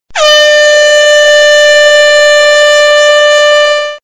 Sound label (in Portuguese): buzina alta